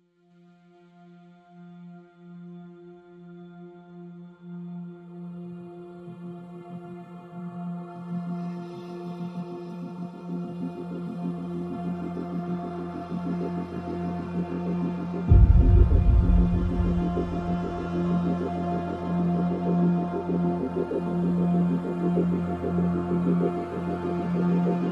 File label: No Strings